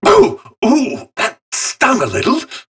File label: Training Dummy Pain; Warframe; Duviri; Target Dummy